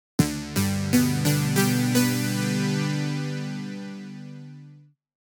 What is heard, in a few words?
Glitter Bells, jup8; Aphelion